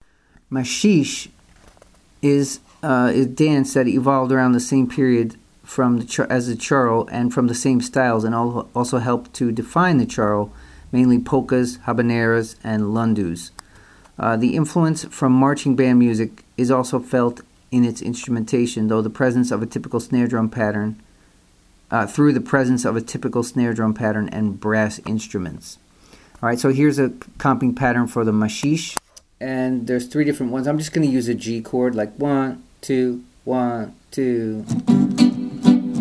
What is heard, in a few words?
brazilian styles maxixe